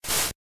slap swipe 1

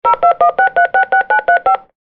dial

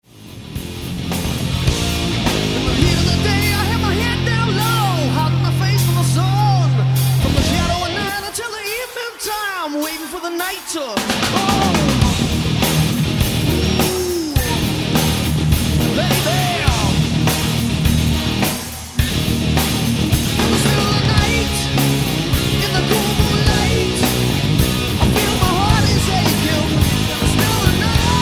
scream

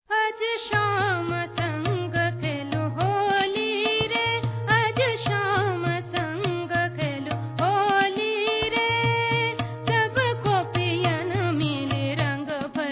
holi song

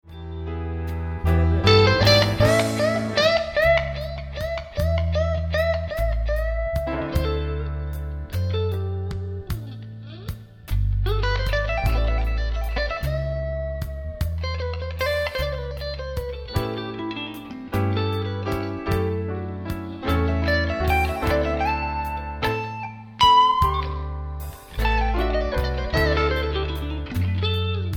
Blues Solo

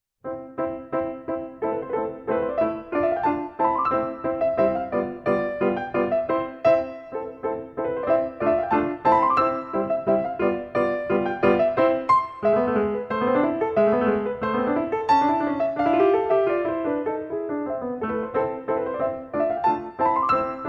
Harmony

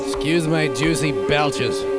belches

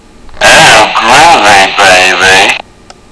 groovy; Austin Powers

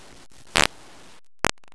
fart

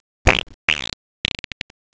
fart

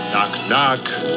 knock knock

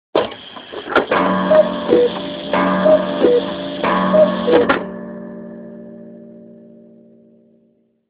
Cuckoo, Nokia 1110

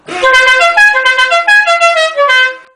cucaracha

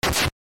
stomp

